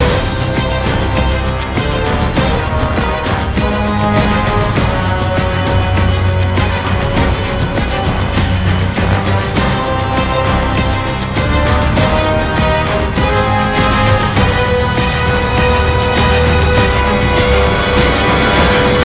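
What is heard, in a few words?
band loop; transformers war for cybertron gamerip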